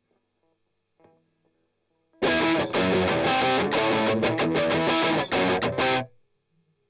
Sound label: Strum Octav